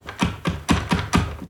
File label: Minigame Release